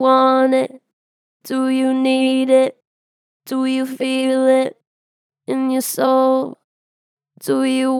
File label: Str Flat Do you want it, Vocals, Pitch Flat Strong